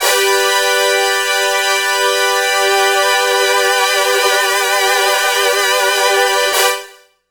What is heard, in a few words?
Brass Section; Synthetic Winds; Dr. Fink's Funk Factory